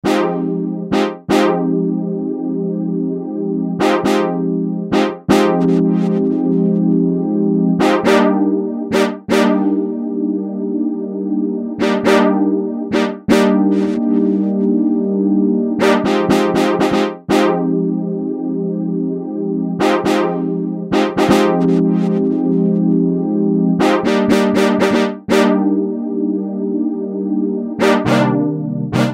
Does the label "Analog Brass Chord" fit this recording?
Yes